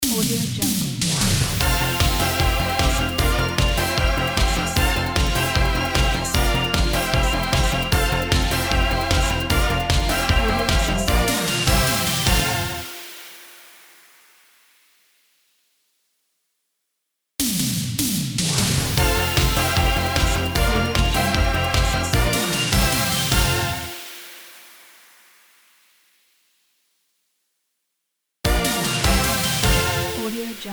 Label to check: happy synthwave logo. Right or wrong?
Right